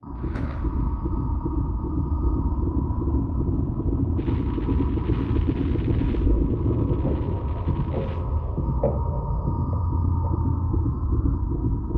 abstract ambiences mix; sonniss gdc

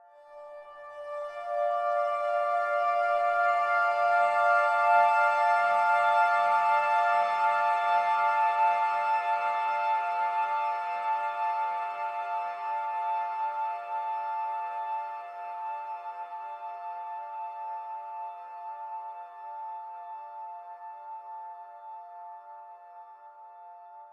melody synth atmospheric d; sonniss gdc

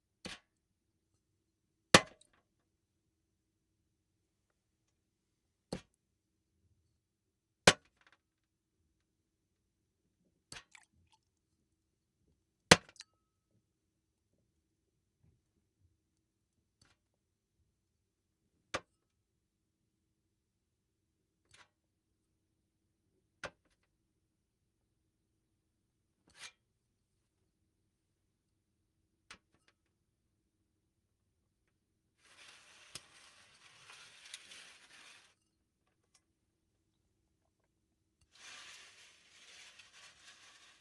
cup; fvtt sonniss gdc audio

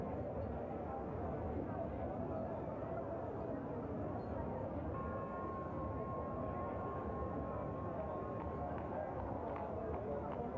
Medieval City Distant; teppen

